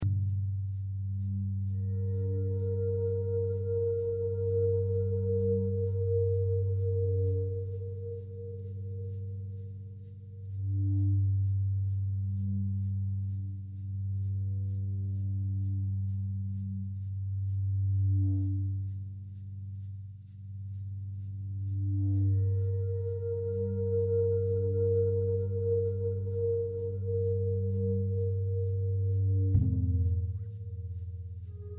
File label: school inside pool night musik; life is strange